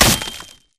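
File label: Impact Pulse Blade Wood; Titanfall 2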